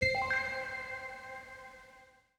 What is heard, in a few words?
spin music click